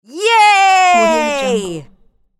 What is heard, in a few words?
enthusiastic yay woman cheers